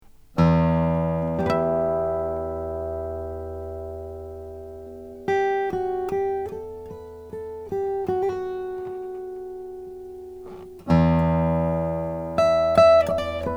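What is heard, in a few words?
strahm eros wild birdseye maple, guitars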